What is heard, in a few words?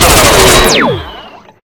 rifle; general combat enemy